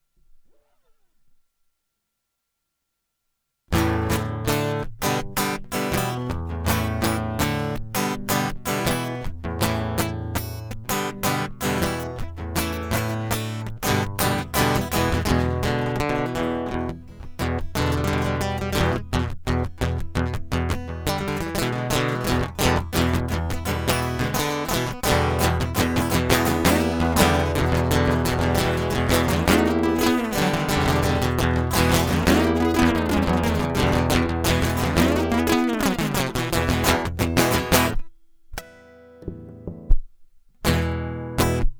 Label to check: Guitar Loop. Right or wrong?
Right